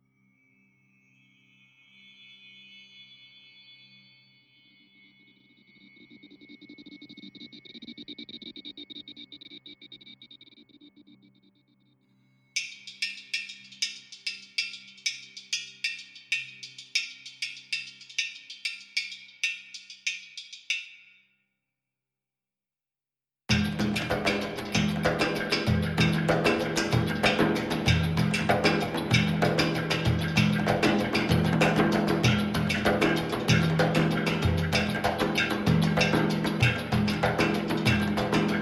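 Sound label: with small percussion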